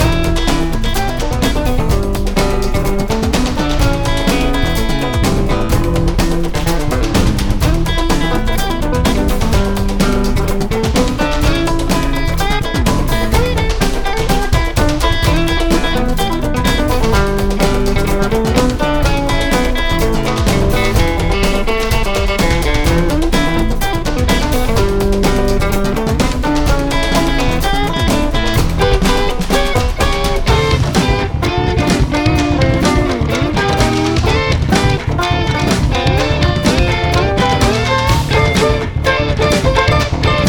Forest Menu Loop; Super Meat Boy; PS Vita

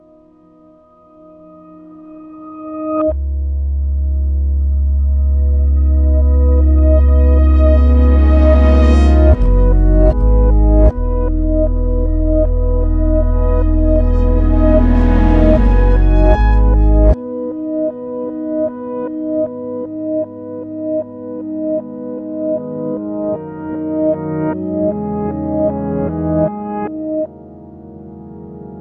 music quiet touching loop reverse

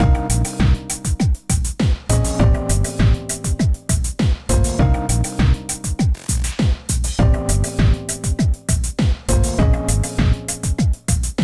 Gameover; Midnight Play Pack

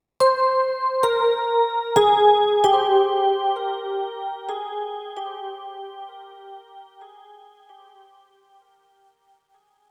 Oneshot Rhodes; zombie army 4 dead war; ps4 stadia switch windows xbox one

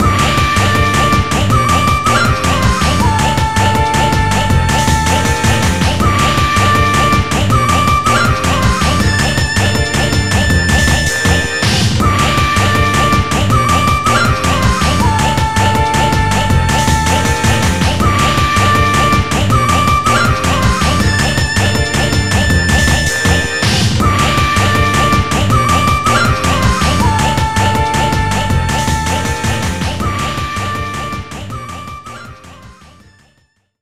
Middle Bonus BGM; pachislot tenchiwo kurau

